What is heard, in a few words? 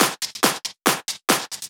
Loop 140 BPM; vengeance essential club sounds-3